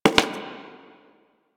Human Loid Foley Step; Characters; Warframe

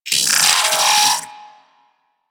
Arachnoid Micro Vocal Death; Warframe; Corpus

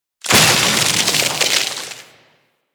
Terra Vent Iced Explosion; Warframe; Corpus; Arachnoid Camper; Death

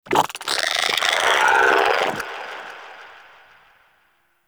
Vocal Angry, Shrimp Lantern, Creatures; Baliq

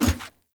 Heavy Grunt Boot Walk Wood, Footsteps; Characters; Enemy; Sinecure

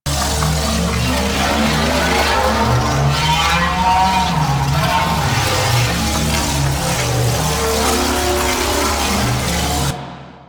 Portal Loop; Enemies; Sentient Dolicholyst

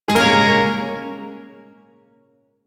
Phrygian Chord L; Warframe; Duviri Shawzin

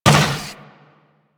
Moa Combined Step Default, footsteps; Warframe

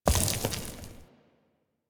Horse Surface Kickup Dirt; Vehicles; Duviri; Steps

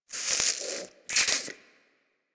Wheeze Close; Warframe; Infested Eris

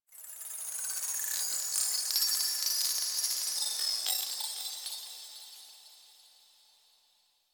Glass Texture Small, Ambience; Warframe; Cephalon Weave; DE

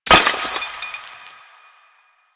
destroy planter hanging

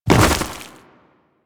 Step Ice, Footsteps; Warframe; Grineer

